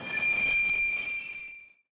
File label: Train Stop